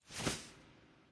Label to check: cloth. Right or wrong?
Right